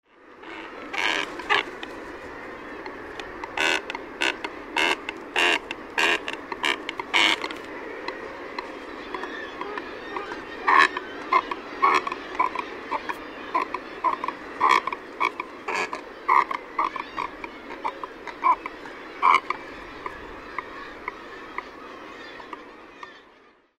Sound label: shag